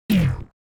Science fiction vintage data error

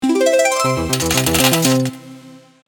Free spin reward casino slot machine

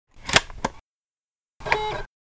CD or DVD inserting a disc into player

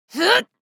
Young woman grunting in pain

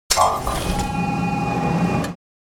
Sci fi heavy ship door opening or closing

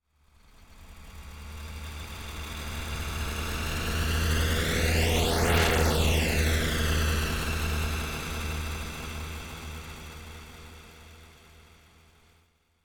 Car passing by slow

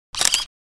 Camera shutter click taking picture

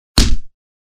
Punching door

